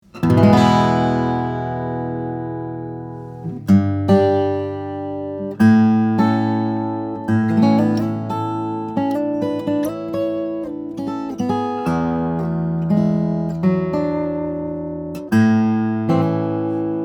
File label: OM2H E CUT, steel-string guitars; collings; indian rosewood; engelmann spruce; flattop